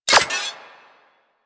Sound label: Sniper Rifle Mech; Warframe; Weapons; Corpus